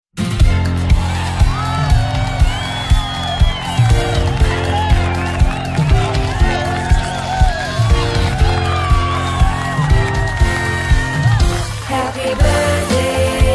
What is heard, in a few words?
Happy Birthday Party Version